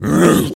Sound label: zombie voice grunt